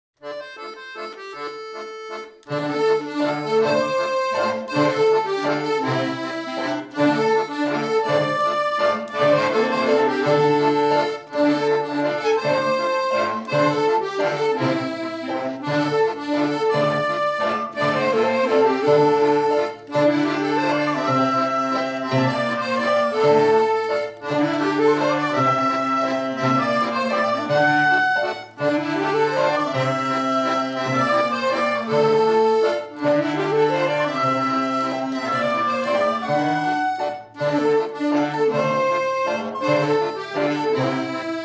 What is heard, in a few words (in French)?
Bourree; Bourree du P'tit Coq